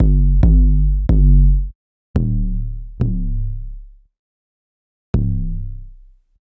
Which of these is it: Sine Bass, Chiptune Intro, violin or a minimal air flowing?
Sine Bass